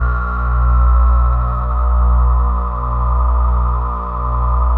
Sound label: ATMO PADS; Best Service; XX Large